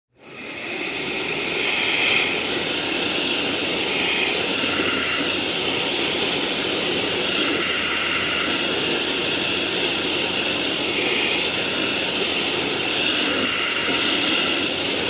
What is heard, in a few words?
Vacuum cleaner